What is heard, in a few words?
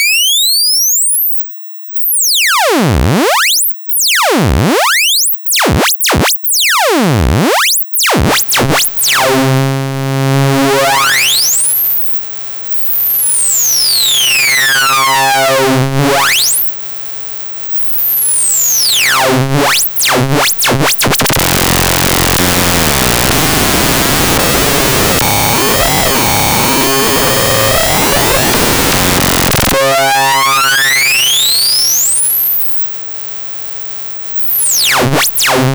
hard sync trimod bigrange